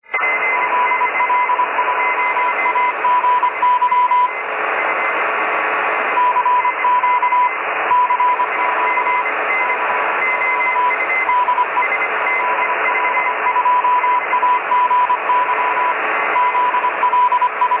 Amateur Radio QSOs using Morse - two are audible in this recording at the same time